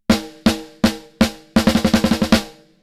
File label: snare preset SNARE DEEP; MIXROOM